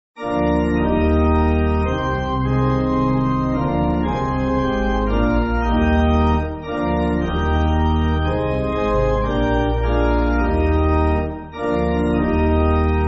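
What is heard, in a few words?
Pipe Organ, Backing Files for Churches; Flandrensis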